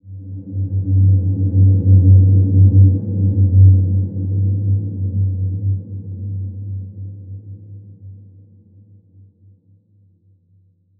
Large Space G2 f, healing soundscapes

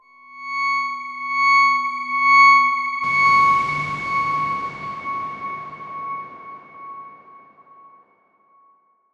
Darkswarm C#6 pp; HSS Ableton Pack1

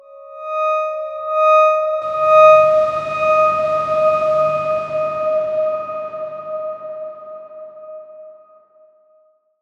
Darkswarm D#5 pp; healing soundscapes; Ableton

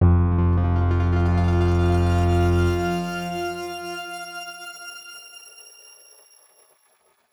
X Grain F1 ff; healing soundscapes; HSS Ableton Pack1

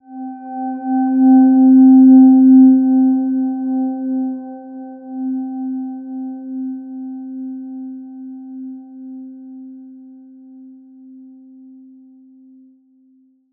Silver Gem C4 mf; healing soundscapes

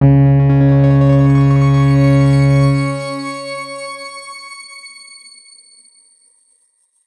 X Grain C#2 ff; healing soundscapes; Ableton

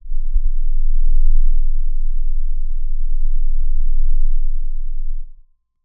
Crystal D0 f; HSS Ableton Pack1

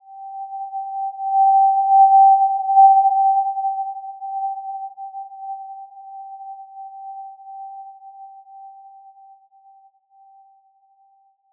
Simple Glow G5 f; healing soundscapes; HSS-Custom-1

